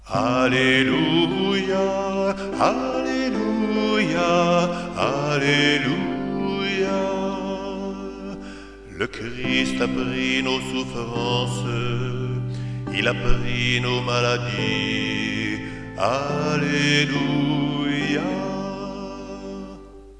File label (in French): Alleluia pascal